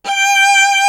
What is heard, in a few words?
SOLO VIOLA; East West; Global Sounds